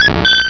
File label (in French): Cri